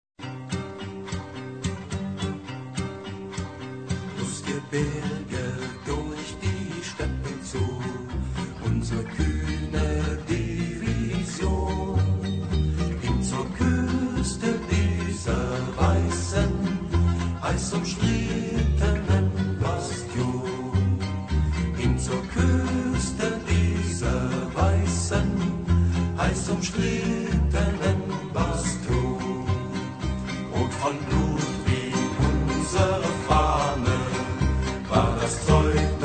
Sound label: Guitar; DDR; Partisanen vom Amur